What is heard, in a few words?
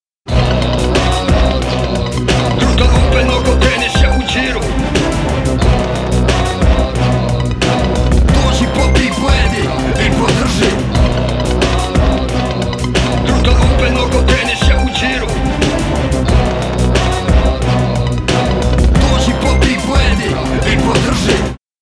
ringtone; Gruda Open 2019